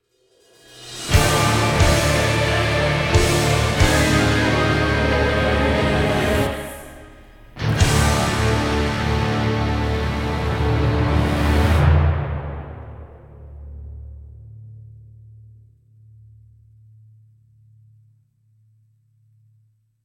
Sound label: music rock; no straight roads